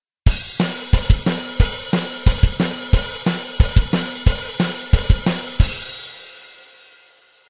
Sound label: 16 Fast